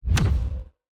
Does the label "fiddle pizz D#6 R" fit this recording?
No